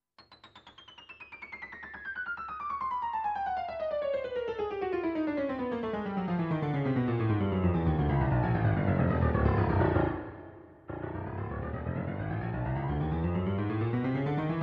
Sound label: Honky Tonk Piano